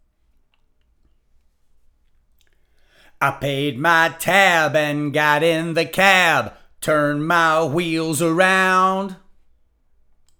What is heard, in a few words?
vocals